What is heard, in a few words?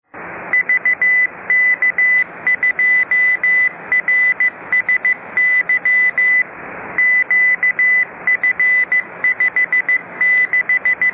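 Amateur Radio Beacon Sydney using Morse; Amateur Radio Beacon VK2RSY Sydney using Morse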